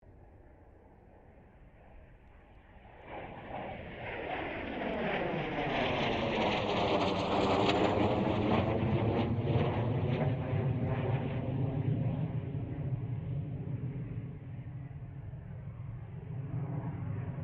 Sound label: AIRCRAFT CA-27 SABRE PASS STEREO